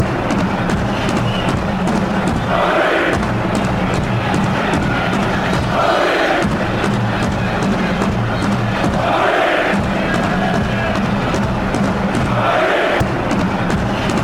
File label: madrid drum